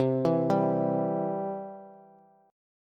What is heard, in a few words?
C Major, C, down, Bass; arpeggiated; chord; JGuitar